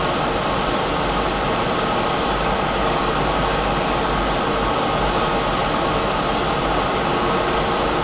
Aircrafts Engines Mig15; DCS

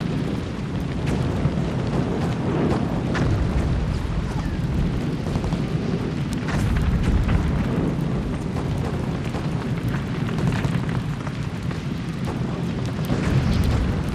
ambient wo explosions; World of Tanks Blitz; hangar loginscreen

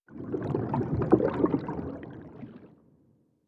ambient battle; World Of Warships

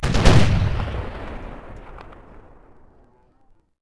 Six Shot Fire; Civ4 warlords

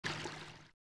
Water Move; Civ4 warlords; Resouces and Improvements

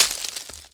tunneler worm dig out

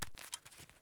sks hand; Escape from Tarkov

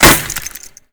impact masonry; Modern Warfare

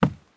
sprint wood; Escape from Tarkov

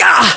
pain; english

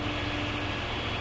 Technic Engine; DCS

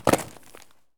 landing concrete; Escape from Tarkov

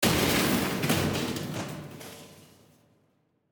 damage hits explosions, Warships

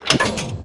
turret aa off

